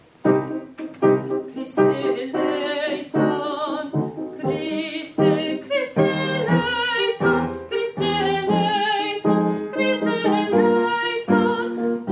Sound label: alto; christe